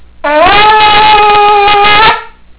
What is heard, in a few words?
tekiah, Tekiah, a 3 second sustained note, Shofar; parsha vayikra emor